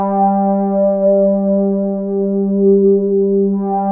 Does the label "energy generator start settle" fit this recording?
No